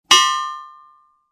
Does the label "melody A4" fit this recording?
No